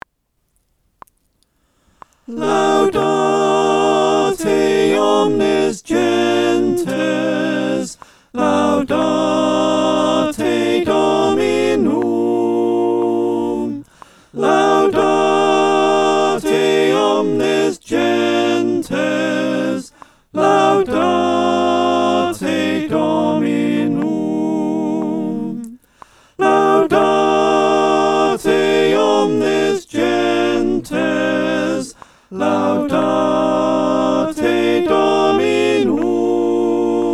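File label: soprano alto tenor bass, choir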